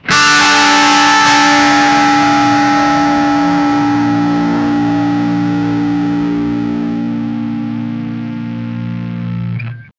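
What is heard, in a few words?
plugging an electric, amplifier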